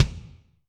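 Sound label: Kick Double Room, Alesis D4; Kik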